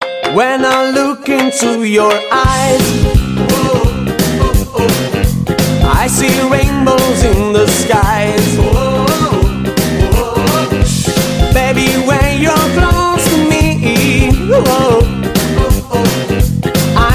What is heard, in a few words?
Best Jive Music Ever